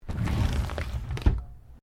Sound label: Drawer Laminate Medium Full Close; Various domestic drawers; Household; SoundEffects Foundation2